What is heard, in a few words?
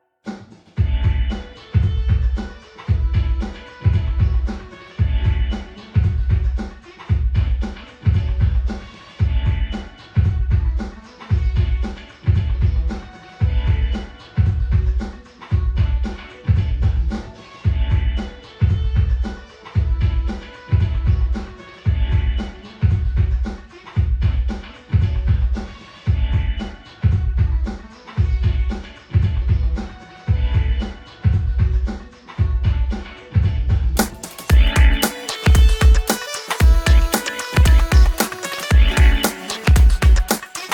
World Tour Menu Music; Street Fighter 6